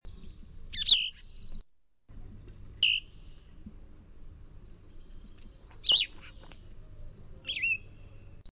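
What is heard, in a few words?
Plumbeous Vireo song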